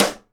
SIDE STICK, Live Snares

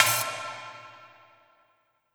perc, Drum & Bass, 170 BPM

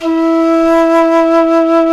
Jazz Flute

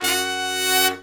BRS SHRT, R&R Horns, Sect; Roland L-CDX-03

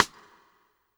SIDESTICKS

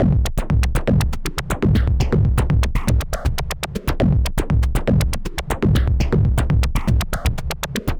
Anabeat Mix, Beats

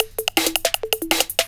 Tick Tock 162, breaks

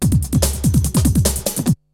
BREAKBEATS, TECHNO

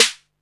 snare, techno 138 BPM; Best Service ProSamples vol.54; AKAI

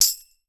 TAMBOURINES, Percussion

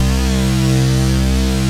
METAL KEYS; Pro-Rec - Synthsonic; Z1 DANCE

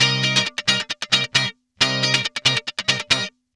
GUITARS 135, House

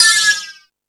Hip Hop, Drum Machines

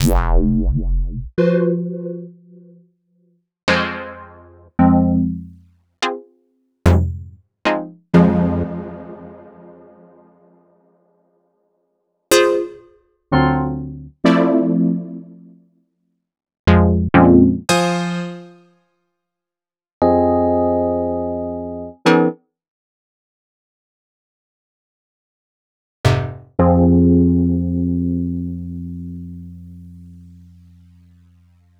stabs

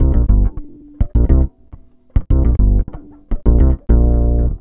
E-Bass + Analog Synth; Sonic Foundry (Sony Creative Software); Leo Cavallo Bass Taster

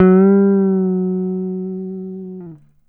GTR TRIK, Jazzistic; Univers Sons

